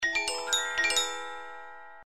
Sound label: circus win; games; Crazy Circus